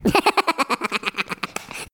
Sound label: laughing sped up